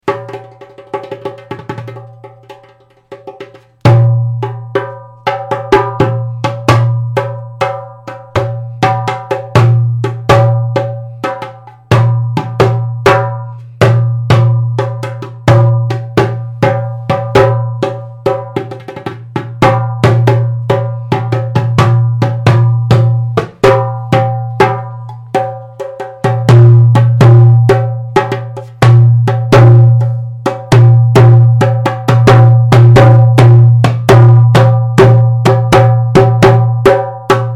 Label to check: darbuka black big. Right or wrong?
Right